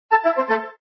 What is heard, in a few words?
lose Sound; jackpot 6000